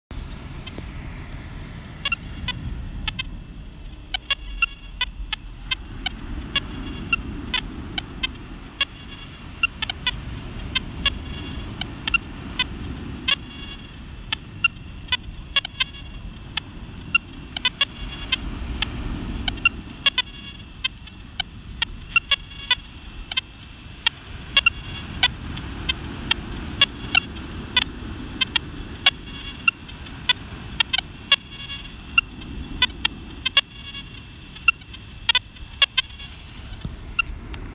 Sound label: RF radiation at ocean; high RF levels on the coast away from any visible structures or antennas; All of a sudden concerningly high RF levels on the coast away from any visible structures or antennas